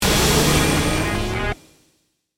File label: Stinger Hit Music, Production, Element, Stinger, Hit, Musical, Distortion